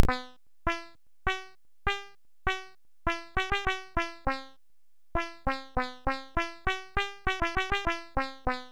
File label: koto; SID